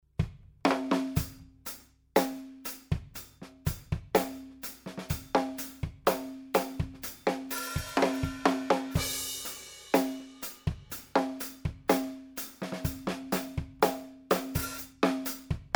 Snare and mono overhead phaze aligned reversed polarity